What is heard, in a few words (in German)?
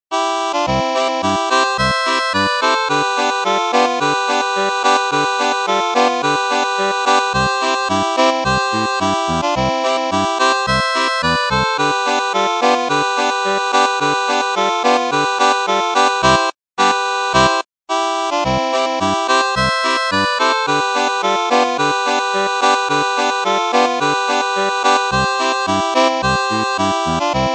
Polka (Volksweise) - 2 Harmonikas; Petruler Polka